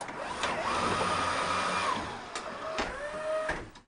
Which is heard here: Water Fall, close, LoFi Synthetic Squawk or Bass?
close